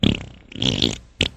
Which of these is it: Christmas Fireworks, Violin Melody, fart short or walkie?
fart short